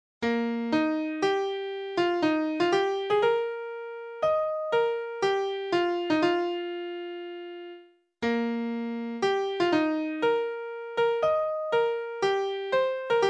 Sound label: navy piano